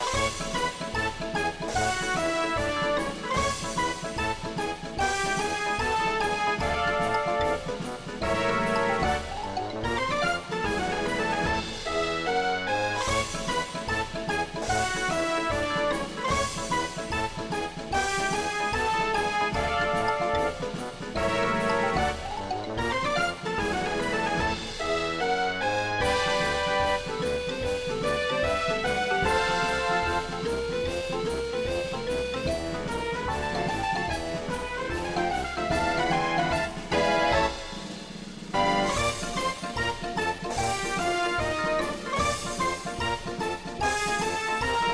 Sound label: BGM Free Spin, SLOTS; Marvelous Circus